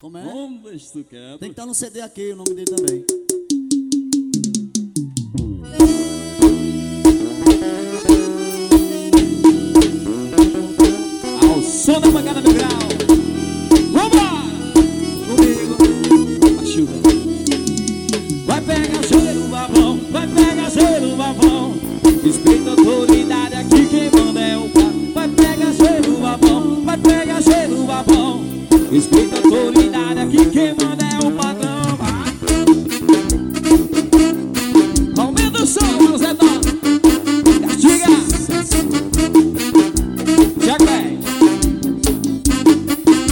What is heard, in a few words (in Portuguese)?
ao vivo medios graves; by nailton cds